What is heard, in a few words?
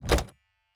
reel bounce; spinsane; netent